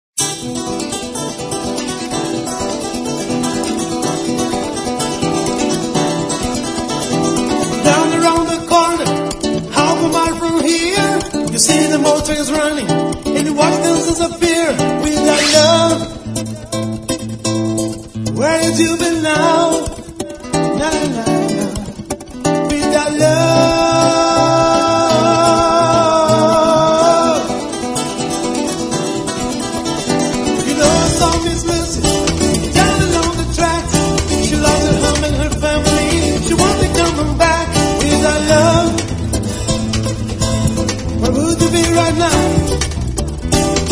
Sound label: (Acoustic)